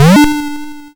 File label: Big Jump; SFX; YM; ChipShop Deluxe; ChipShop Hits